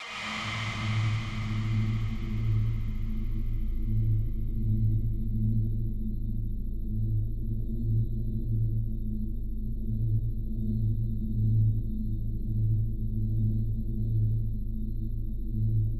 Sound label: Horn Path 1 Intro 105 bpm